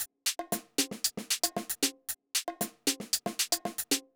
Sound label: Drumloop 115bpm, Nu Disco; Zero-G